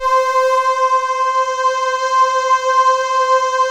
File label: CRUMAR; Masterbits; Vintage Voltage